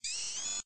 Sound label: mechanism up; Realme; C2; ui